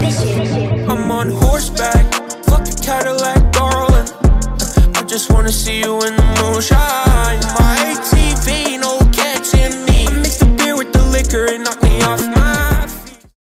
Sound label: country synthwave